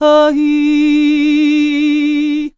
MEH1 VOX, Balkanish Traditions

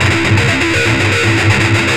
Riff, Industrial; Nasty

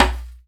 Djambe, Akai MPC2500

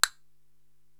Castanet-Single; Korg TR-Rack

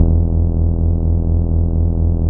HUNGRY BASS, Bass Analog & Synth; USB Soundscan vol.12; AKAI

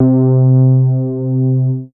C3, BASS; Q Up Arts; Dream Experience; BASS BANK 13

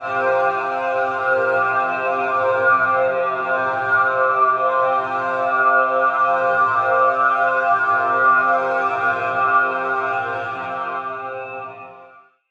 Reso Gliss Pad C, Spacey; Zero-G Ambiosis

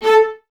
String Ensembles, Violas; A 4; Miroslav Vitous; LINKS ORCH

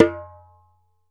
ASHIKO, African Drum

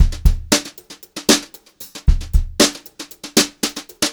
beat, Brushed Drum Loops; USB Soundscan vol.39; AKAI; 90 s Sample CDs; 116 HOTROD

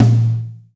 NATURAL, TOMS; Spectrasonics; Burning Grooves; BG TOMS

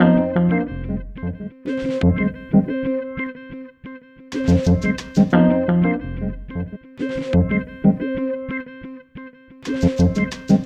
Melody, Hip-Hop

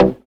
Synths Root C

